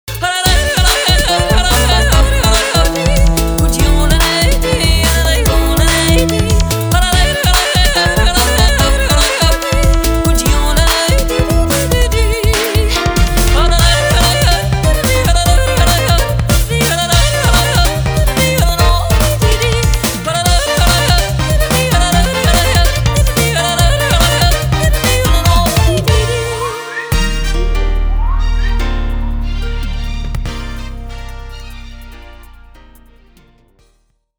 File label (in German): Jodler Ende